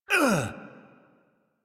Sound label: Pain; New War; Ballas